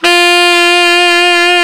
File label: TENOR SAX; Alpine Volksmusik